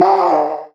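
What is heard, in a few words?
"oh" vocal, C3; Evolution Of Sound; Tropical Revolution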